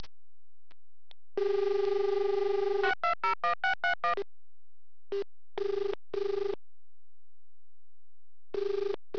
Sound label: new AXE10 ring